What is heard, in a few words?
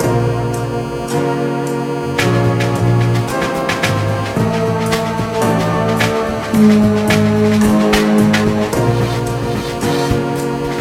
Dungeon Depths; Bloodsauce Dungeon Depths; Pizza Tower; Scoutdigo mod